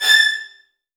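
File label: VN FX A4 right; ILIO; Kirk Hunter Virtuoso Strings VOL-1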